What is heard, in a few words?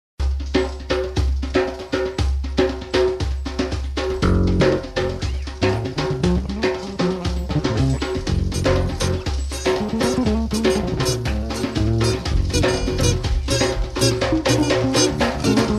Caribbean Jazz Dance